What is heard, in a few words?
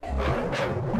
Snarl